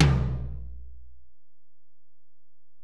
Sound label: Tom, Alesis DM-PRO